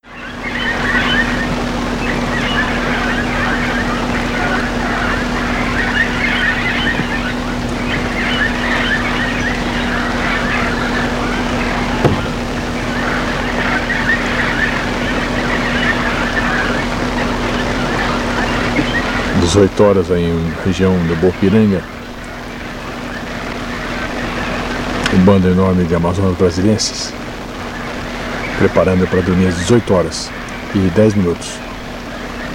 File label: Amazona brasiliensis; R Preto; Itanhaem SP; Silveira